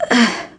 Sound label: Dizzy; Sheik turned dizzy